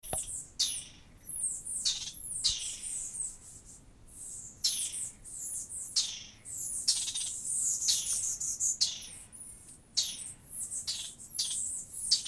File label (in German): Laute Eliomys quercinus